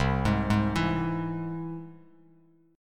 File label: C Augmented down Bass Standard; arpeggiated; JGuitar